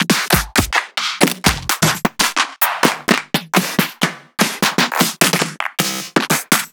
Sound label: Claps